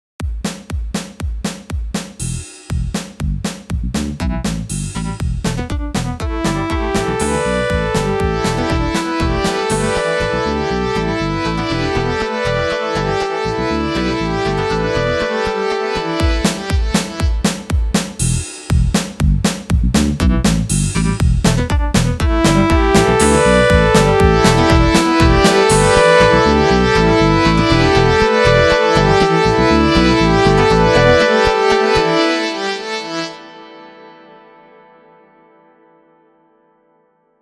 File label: rock